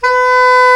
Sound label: SAX SOP MF B, Soprano Sh; Roland LCDP07 Super Sax